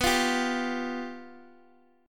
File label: F Suspended 2nd Flat 5th, chord